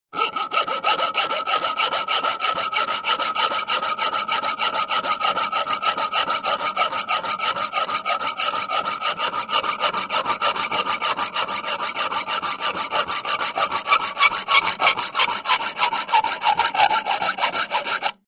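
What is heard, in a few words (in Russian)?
ножовка по металлу; Распиливание дерева и металла ножовкой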